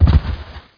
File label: CHUTE